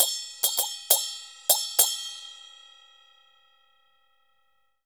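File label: CHINA SM15 L, China cymbal; Spectrasonics - Heart of Asia CD2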